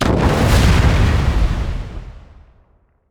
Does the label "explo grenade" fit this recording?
Yes